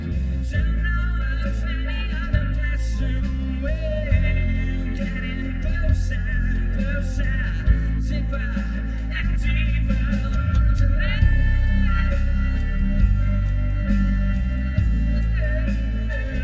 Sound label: bass